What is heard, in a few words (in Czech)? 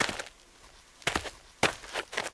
kroky; Mafie 1